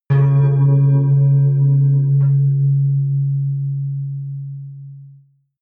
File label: synth bass bell